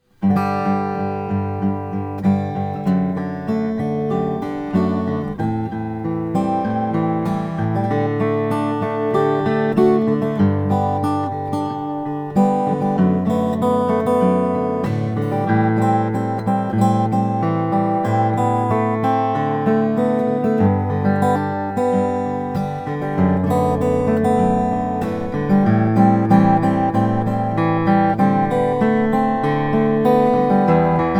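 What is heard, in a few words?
rainsong ws1000n2 black ice, guitars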